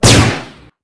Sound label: fire; lmctf